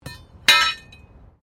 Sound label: METAL SPIKE FALL; Materials